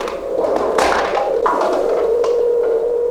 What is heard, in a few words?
Antimatter Perc, Processed Drumkits; Sonic Foundry; Sony Creative Software; Zero Gravity Beats